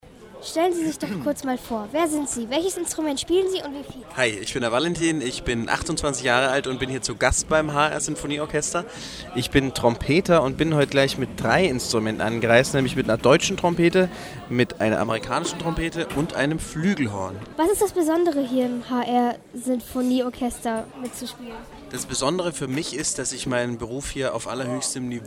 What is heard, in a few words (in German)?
Trompete; HR Sinfonieorchester